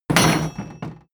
wood impact metal rattle hard

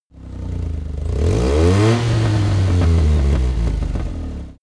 car buggy rev up

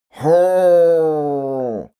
male grumble happy; menschen; koerpergeraeusche; soundpool

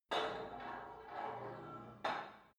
put weight onto barbell reverby; sport freizeit; fitnessstudio

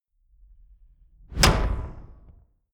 rising metal light, Whooshes; whooshes rises

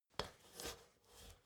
step ladder metal scrape single

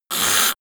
monster choking high; comedy-cartoon; soundpool

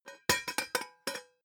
pot lid drop close; objekte; topf

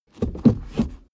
suv change reverse gear; verkehr transport; autos

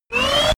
sci-fi laser shot charge short; soundpool

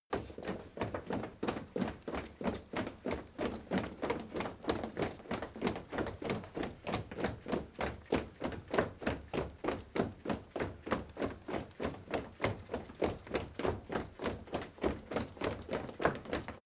step crowd run quiet sequence; schritte

